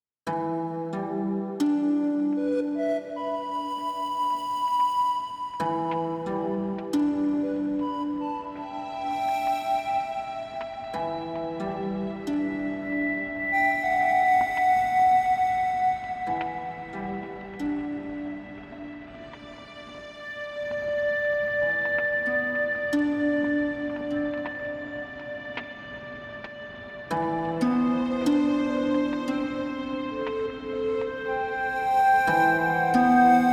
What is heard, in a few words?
Trance